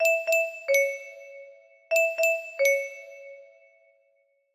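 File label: lullaby, music box melody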